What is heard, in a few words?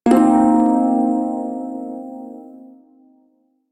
Shawzin Blues Chord; Warframe; Prime Shawzin